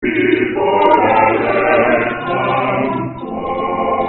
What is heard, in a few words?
quartet test